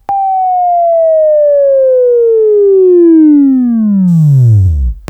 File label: Buzz; Labview Program; Sweeping Training program